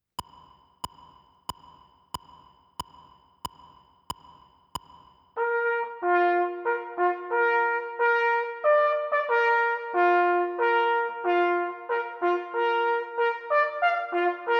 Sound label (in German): sammelruf horn, halali jagdszenen; Gerhard Sowa